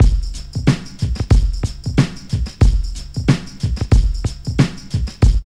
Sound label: BUBBLY 92, Funky Elements LOOPS; Zero-G